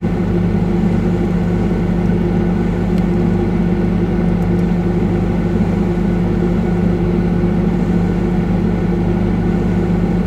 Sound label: household ambience inside domestic freezer